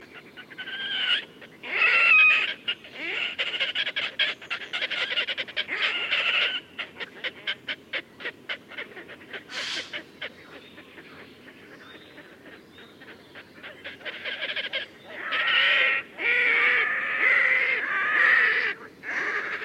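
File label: red-necked grebe voice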